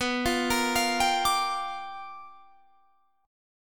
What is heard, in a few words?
G Minor 7th, B; Gm7/B; arpeggiated